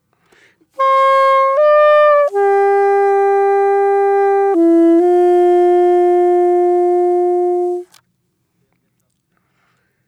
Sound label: Melancholic Dry, brass ambient melancholic dry; сэмпл